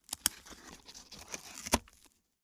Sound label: match tear out; lighters & matches